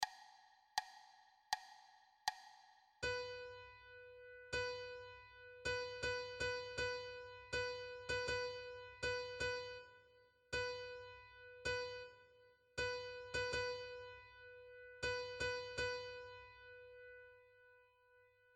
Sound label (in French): Sol diese, flute a bec